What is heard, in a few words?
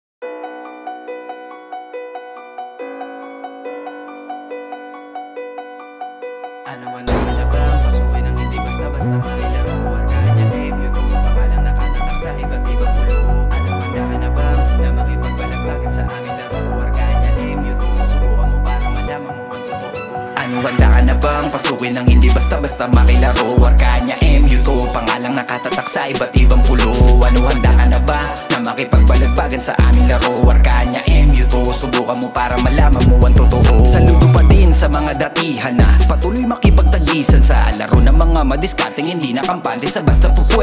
Pub; Arkania